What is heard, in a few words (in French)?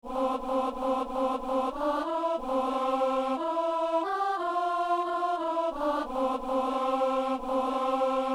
psaume, alto; Messe du 17 janvier à Saint-Denis; Psaume 116 (117)